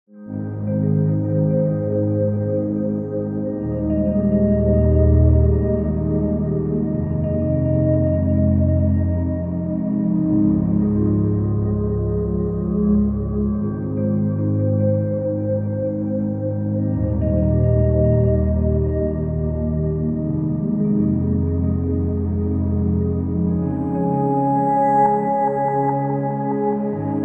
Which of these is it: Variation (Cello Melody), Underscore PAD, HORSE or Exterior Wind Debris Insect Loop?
Underscore PAD